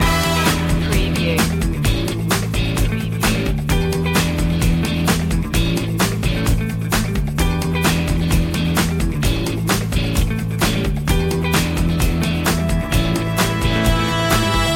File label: re rock funk loop